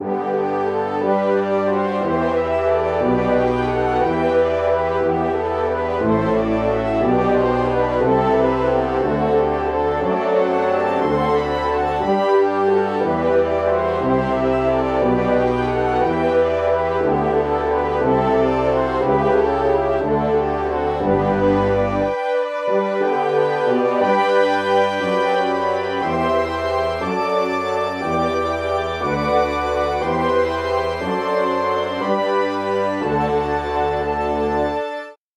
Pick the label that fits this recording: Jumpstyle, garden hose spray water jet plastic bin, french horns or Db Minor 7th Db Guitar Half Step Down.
french horns